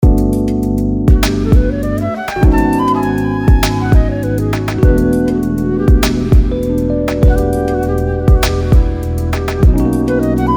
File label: Evocative Flutes